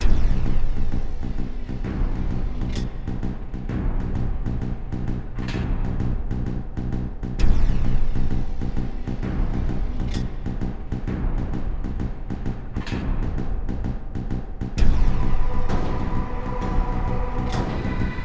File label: stuttershark music; mechwarrior 4 veng